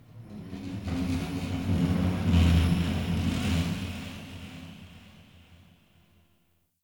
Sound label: Metal Movement Texture Large, Corpus Ship ambience; Textures